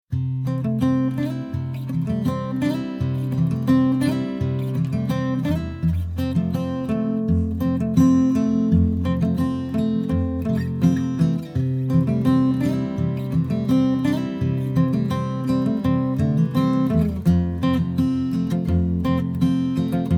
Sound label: Guitar; Barcelo Hotels recepcion